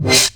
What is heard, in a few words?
stabs; stabs & hits